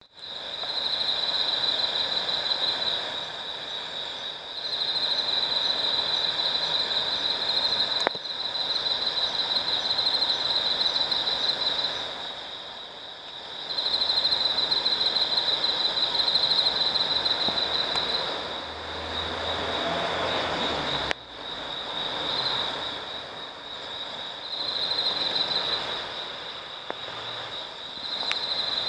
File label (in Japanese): 虫の声